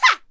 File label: Attack Vocals; Radiant Glaive